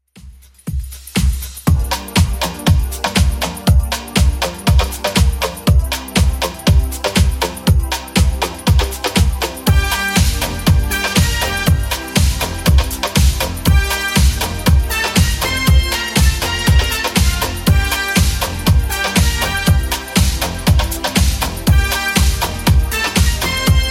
80s Club 120 bpm

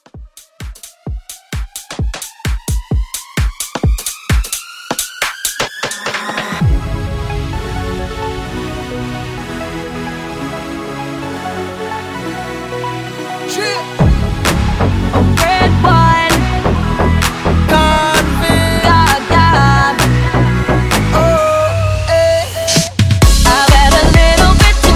mashup 130 clean

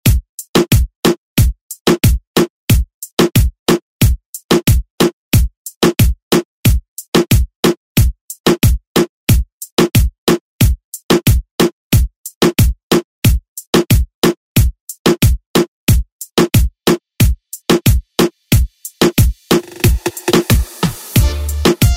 Latin Urban Redrum; Deejay Hit

